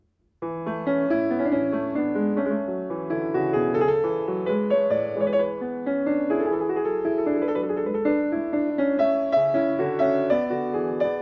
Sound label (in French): Suite En La Majeur Courante